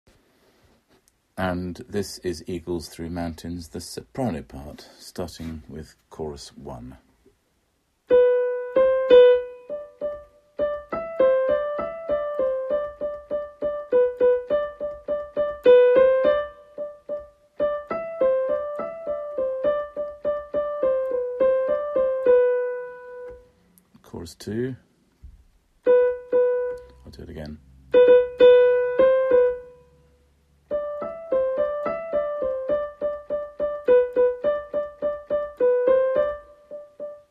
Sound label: Soprano